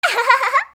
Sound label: "ahahaha"; kanjou